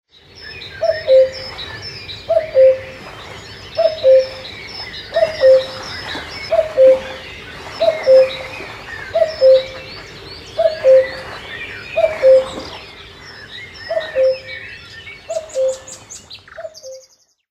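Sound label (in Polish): kukułka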